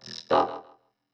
Neo-Wave Vocoder Stop, Pop Rock

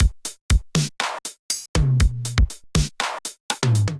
707 Kit, Drum Machines; 707 Funky Beans Kit; Ableton; Factory Packs; Drum Essentials

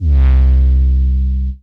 Analog Bass; Ableton; Retro Synths; Analog Bass Vol 2

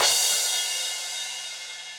Crash 16 Warm And Low, Cymbal; Drums; Drum Hits; Standard Hits; Ableton; Factory Packs; Session Drums Club

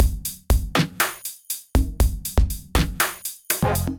Kit, Drums Hybrid; No School Kit; Ableton; Factory Packs; Drum Essentials

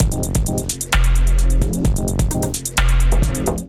Kit Melodic 130 bpm; MIDI Clips; Drums; Trancil Exit Kit; Ableton; Factory Packs; Skitter and Step

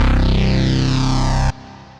Synth Bass; Eminent Threat

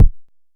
analog quick, Kickbank

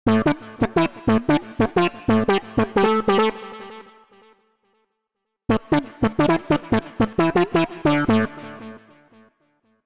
Horn D Minor 88 bpm; MIDI Clips; Tonal; Melodic; Light Horn; Ableton; Factory Packs; Chop and Swing

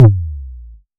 modular pitched, Kickbank

